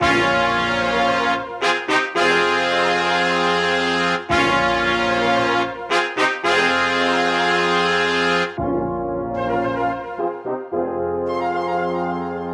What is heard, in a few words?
112 BPM F Min, Melodies; Legend; Cymatics - Immortal Production Suite Beta